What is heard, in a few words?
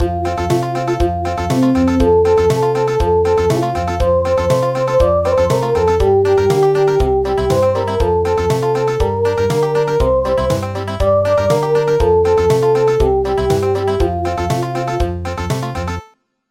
Country